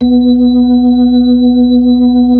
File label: B-3 Chorus